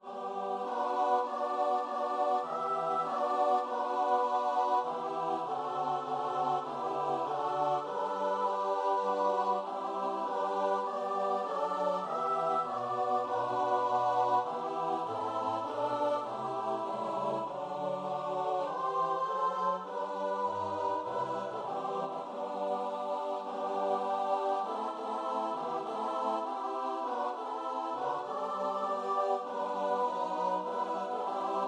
choir

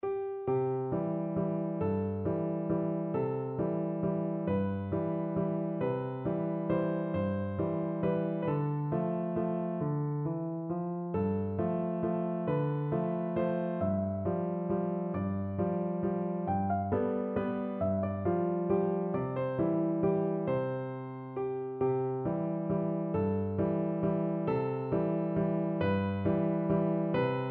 vals piano

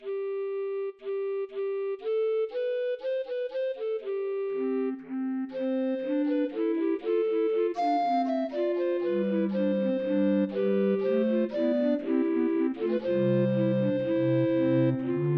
recorder

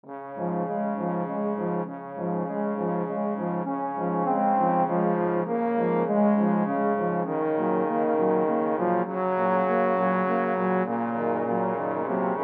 saint saens swan, trombone quartet

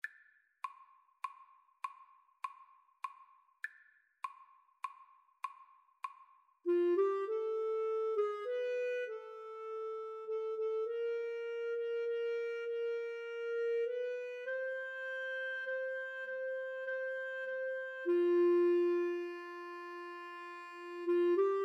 rugged cross, Flute-Clarinet Duet

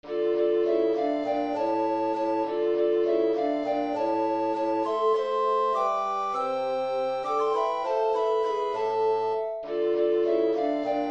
recorder quartet

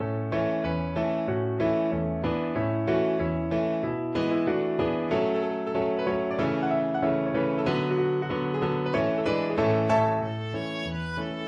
viola

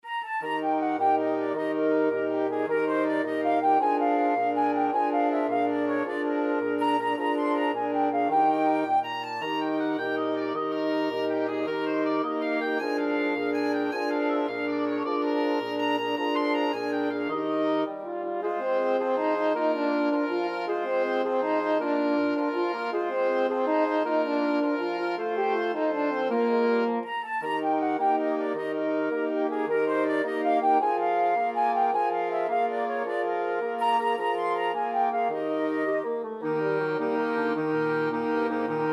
flexible mixed ensemble 6 players

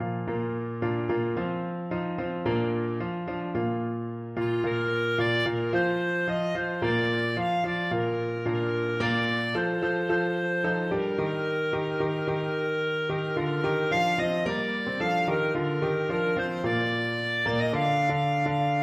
emanuel, clarinet

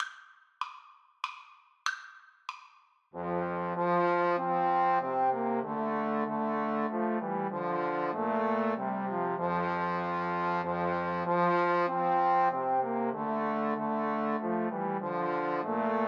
Christmas Hymn trombone trio

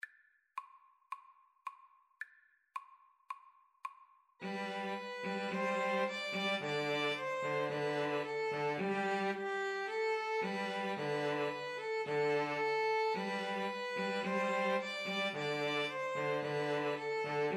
ode to joy, String trio

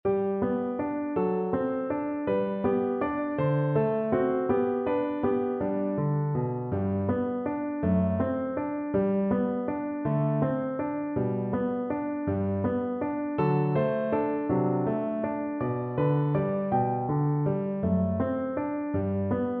Recorder

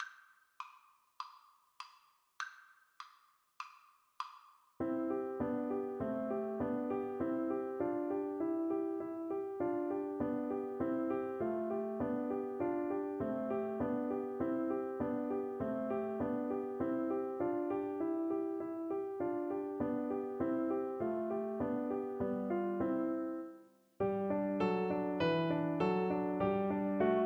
humming song, Flute

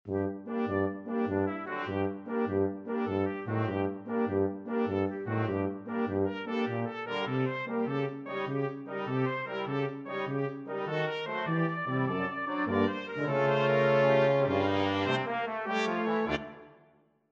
brass quintet

